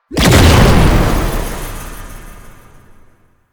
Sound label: Research Pod Activation A; JadeShadows; Ascension